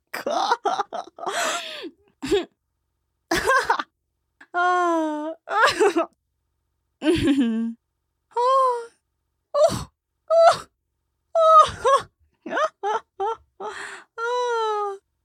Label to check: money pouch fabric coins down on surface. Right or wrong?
Wrong